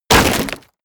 break wood small

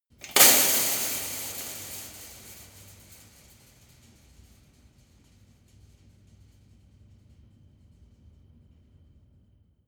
metal impact fence long

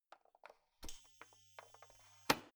oven turning on induction

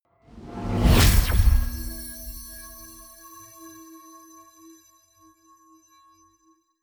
magic warp personal jump

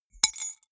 key ring small drop stone hard

